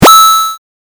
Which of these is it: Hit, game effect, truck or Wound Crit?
game effect